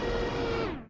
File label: starter fade, Train cl158; OpenBVE